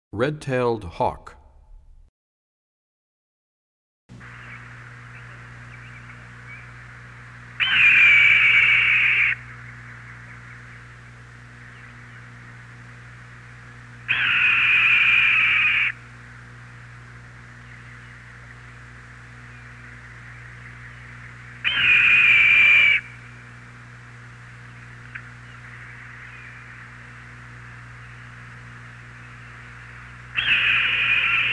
Red Tailed Hawk, bird songs; 101 Bird Songs

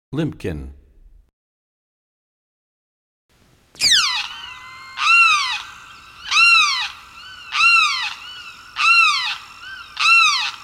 Limpkin, Birds; 101BirdSongs

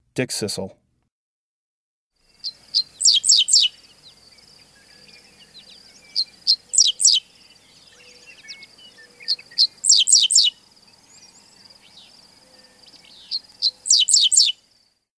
Dickcissel

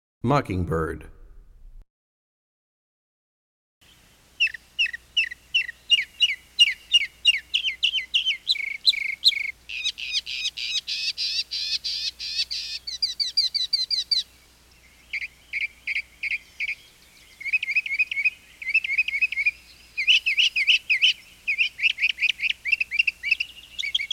Mockingbird, Birds; 101 Bird Songs

